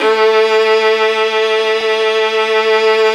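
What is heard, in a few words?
violin vibrato, Orchestral; Violins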